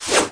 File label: clicky things, Clicky Gadget Things